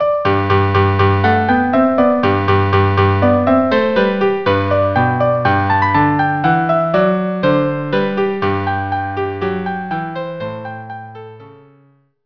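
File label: marche violin cello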